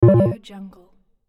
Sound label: error warning